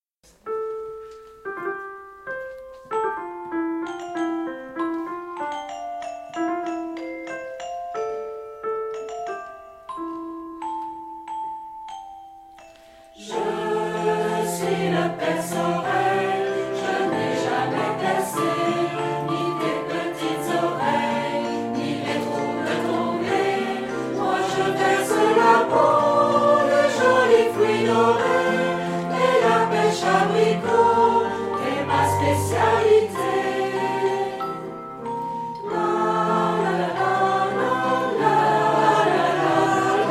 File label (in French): Perce-oreille abricot version chantee